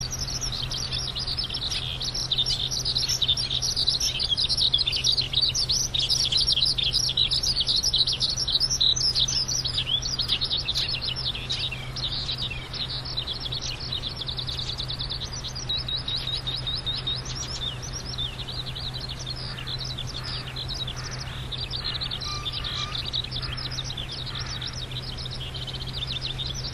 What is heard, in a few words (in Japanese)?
hibari